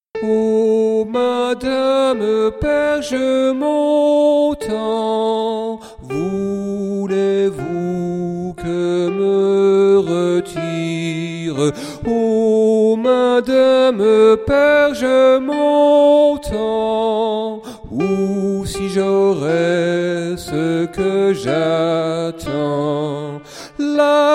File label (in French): Sop madame soprano